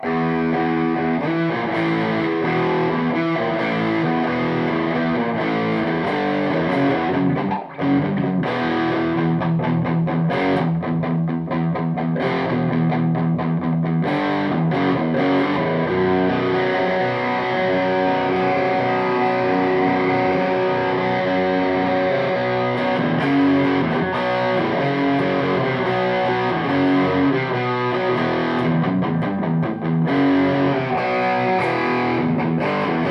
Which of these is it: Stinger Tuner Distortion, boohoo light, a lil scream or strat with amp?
strat with amp